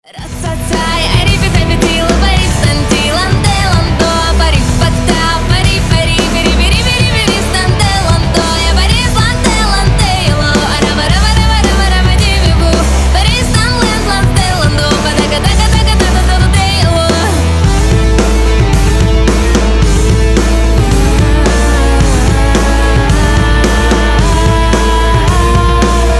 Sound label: polka cover